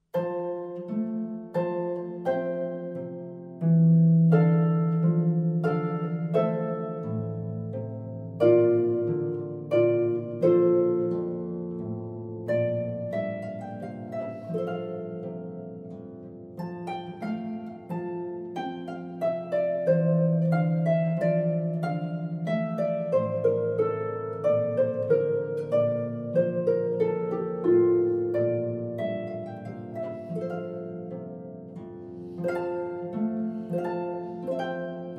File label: Purcell Ground in F arpa; H Purcell